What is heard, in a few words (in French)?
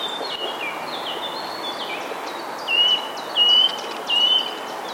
sittelle torchepot etang